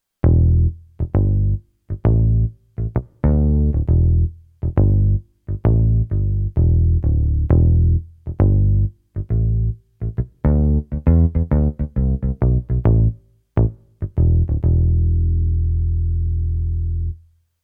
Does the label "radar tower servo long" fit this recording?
No